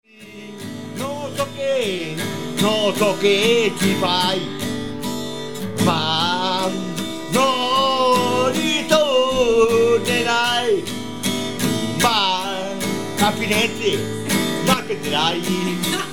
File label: train song